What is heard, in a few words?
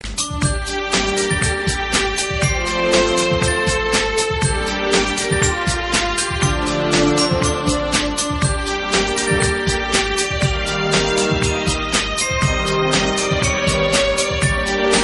remix tone; Harry Potter